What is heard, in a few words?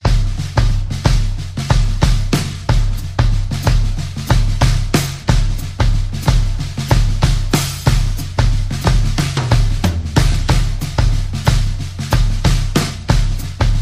Swampy Groove, Drum Set Only